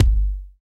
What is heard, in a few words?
BD, BD Dance; Sound & Vision; Gigapack I CD 1